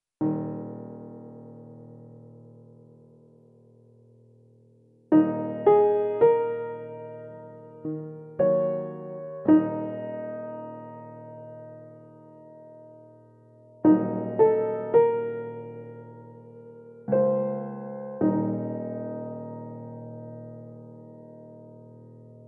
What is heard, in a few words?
SAD FLOWER PIANO THEME; Experimental electronic acoustic music by Patrick Matteis